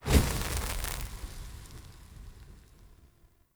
Soul Mode Hand Flame Loop Start; Powers; Fenn

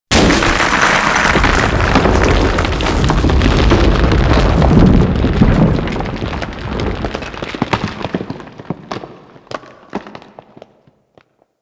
Cave Sounds Random D; Warframe; Ambience; Grineer; Gnr Cavern Corridor 1

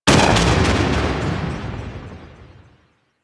TNT Explosion; DE; Warframe; Weapons; Archwing; Powers

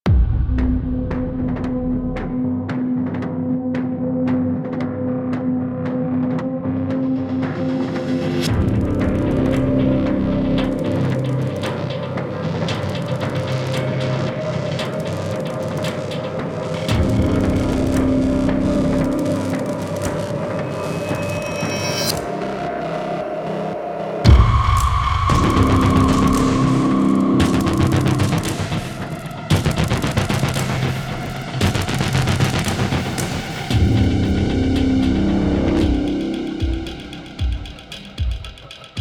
Music Mix; Hunhow Lair; Warframe; Second Dream

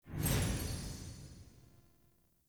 UI Progression Open

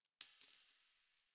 Coin Drop

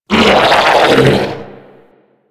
Corpus Scream; Enemies; Abilities; Warframe; Amalgam

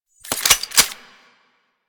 Bladed Pistol Reload; Warframe; Tenno

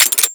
Air Pistol Mech; Warframe; Corpus

